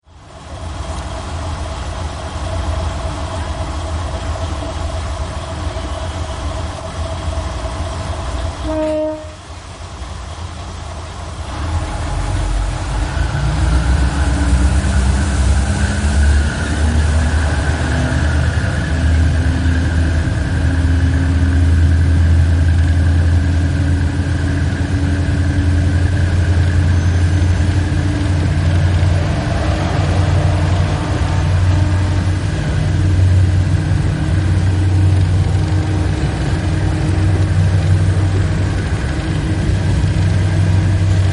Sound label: kiha26